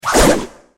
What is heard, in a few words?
katana slash